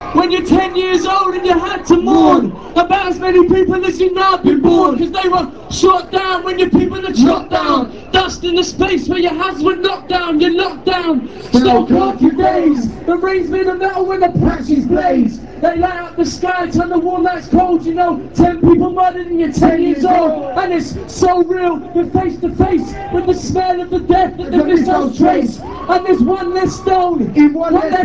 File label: stop bush hip hop